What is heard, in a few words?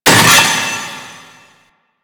Vaults Stake Gate; Warframe; Entrati